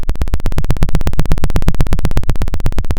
Sound of a helicopter

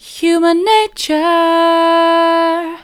human nature soft, vocal

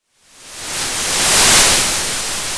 Sound of waves crashing on a shore

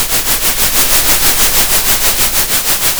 center, Sound of a train

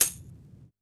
Vinyl Tamb

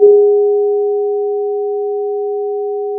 Sound of a church bell

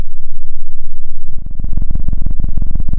pop, Sound of a cat purring

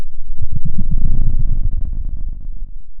pop, Sound of a dinosaur

